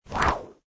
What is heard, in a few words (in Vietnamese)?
Tieng vung dao quo gay vut